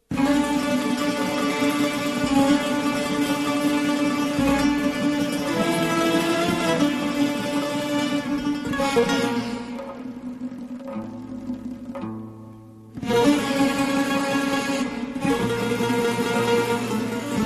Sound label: Instrumental